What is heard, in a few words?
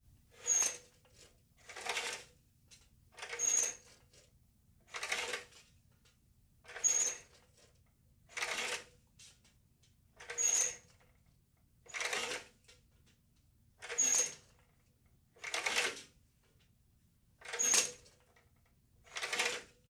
Venetian Blinds Up and Down; Foley; Props; Foley Essentials